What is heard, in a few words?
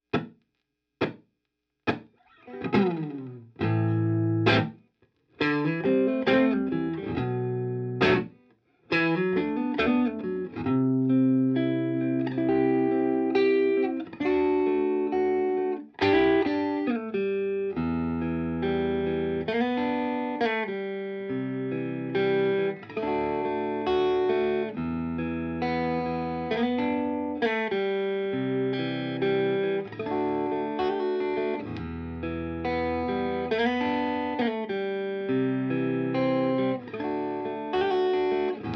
st white s drive center